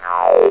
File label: BURP; GEOGRAFIA